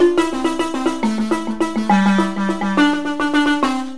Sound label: tenor, marimba; Performance Tenor; Keys: 2 3/4" or 3" wide padauk; resonators: 3" PVC, single unit, detachable; Frame: oak or cherry